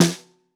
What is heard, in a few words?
SN Bottom, Snare